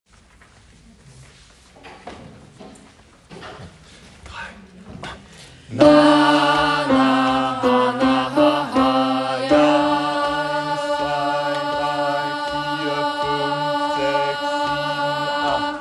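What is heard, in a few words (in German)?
Alt 1 Takt, gospel choir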